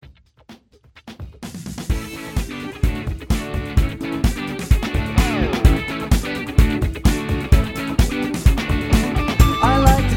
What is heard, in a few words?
Instrumental with Harmony